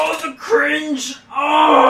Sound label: the cringe oooh; pyrocynical